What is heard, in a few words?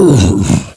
Bloat Pain; Bloat Santa